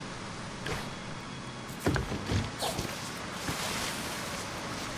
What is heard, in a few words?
waterfall; ori and the blind forest